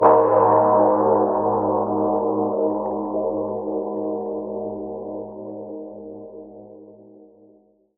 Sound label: Spooky Siren Eerie